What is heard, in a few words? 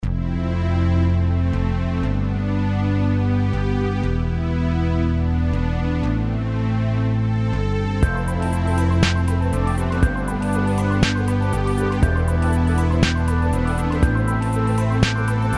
MIDI